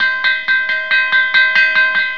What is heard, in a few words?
bell smal